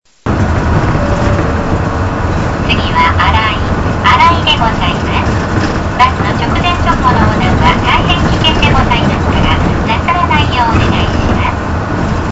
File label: bus tape orgel; aichi; chitabus